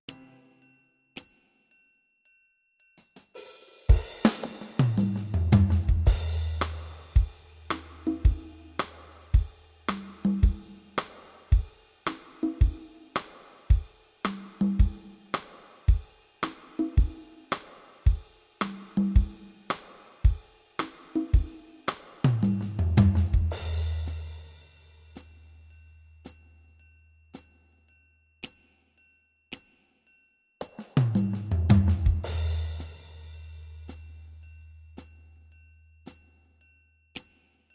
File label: beat